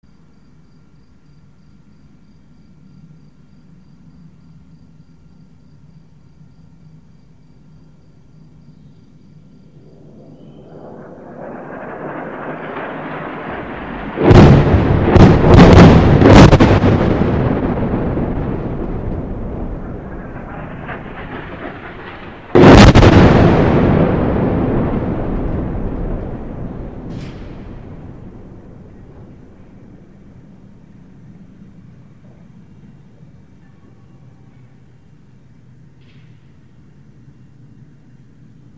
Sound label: tripoli explosions; recorded by bbc